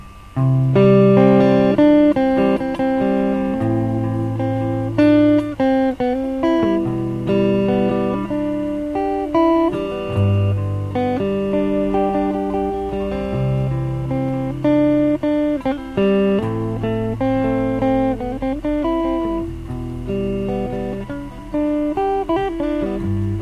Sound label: Clean guitar piece